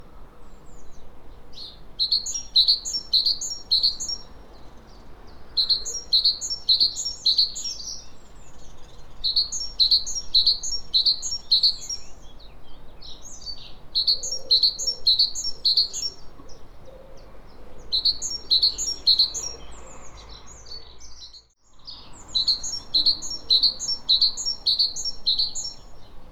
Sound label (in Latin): Parus major; SETE